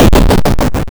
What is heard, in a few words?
Explosion